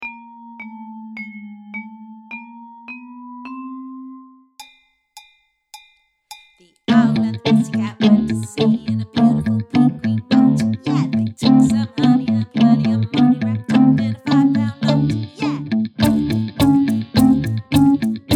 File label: Chest voice speech quality